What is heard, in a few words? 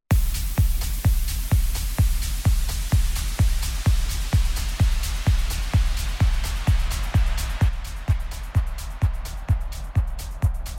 RMX 60 Gate